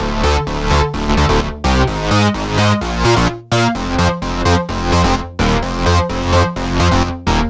128BPM Melody; Vengeance Samples; Timebomb Kit

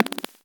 Minimal Perc; Minimal Percussion

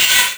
Cymbals HH Open; Vengeance Samples

